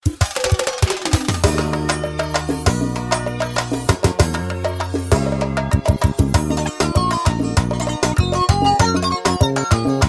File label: playback samples; dodge ram